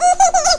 baby laff

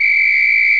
whistle

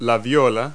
viola; Multi-Lingual Talking Picture Dictionary

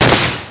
grenade; Worms; Amiga

